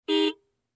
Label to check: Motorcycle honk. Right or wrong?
Right